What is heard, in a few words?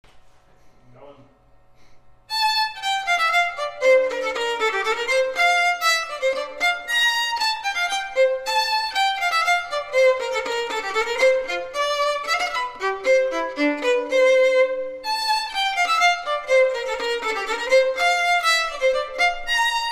Hornpipe, violinist